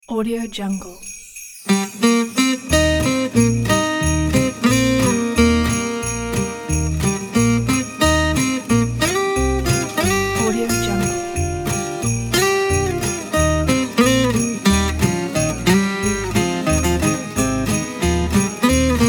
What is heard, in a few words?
jingle bells guitar